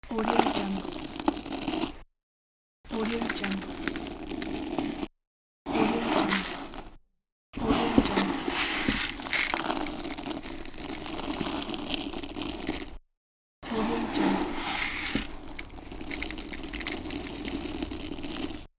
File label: grains